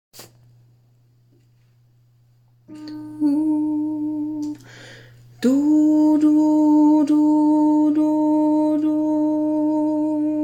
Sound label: alto verse